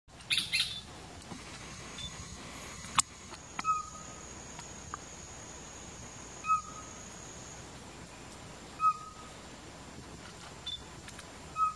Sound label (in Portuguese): Canto do corneteiro da mata